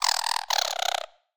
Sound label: Tiny Creature Fast Stutter, Monster Sounds; The Decline Of Warriors; Monster Sounds Volume II